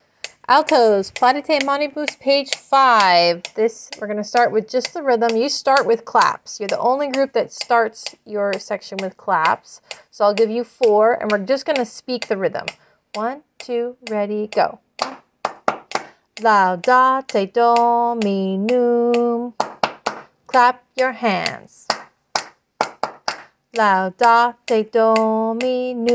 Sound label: Alto; CHAMBER Choir